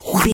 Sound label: lizard tongue lick